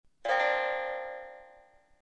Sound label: morning, zvuki telefona blackberry bold 9700